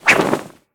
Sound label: referee Pig flag